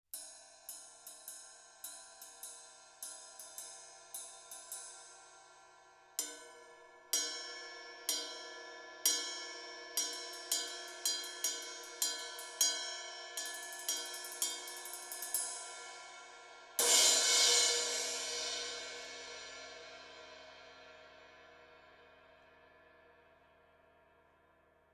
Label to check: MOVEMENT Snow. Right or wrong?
Wrong